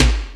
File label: DR-660 Kick, Boss DR-660; 90 s Sample CDs; 300 Drum Machines